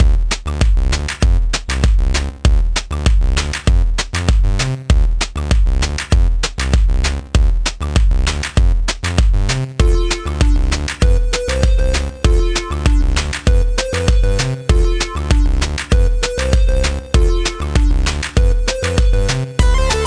Cave Glide; Super Fowlst

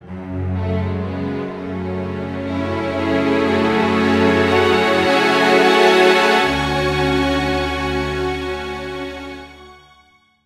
gameover; Record of Lodoss War Deedlit in Wonder Labyrinth

